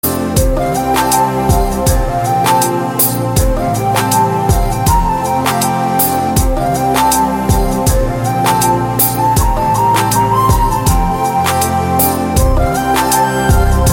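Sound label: background music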